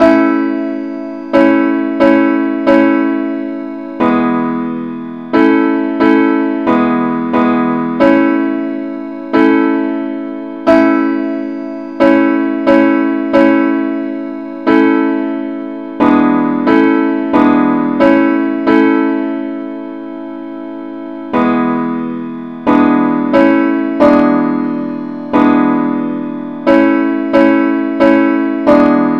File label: henley simple acc key a